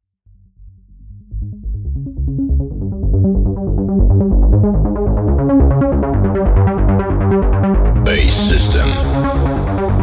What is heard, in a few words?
energy vox; gigaloops